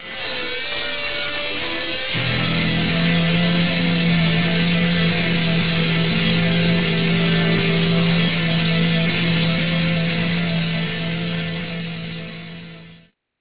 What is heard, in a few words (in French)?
theme principal